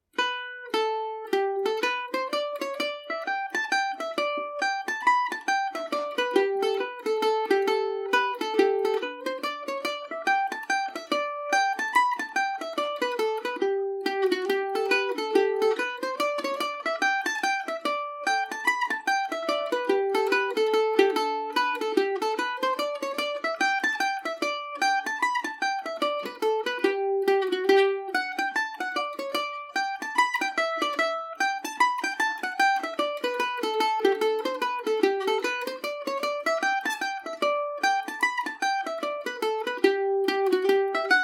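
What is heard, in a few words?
hornpipe G major, Irish mandolin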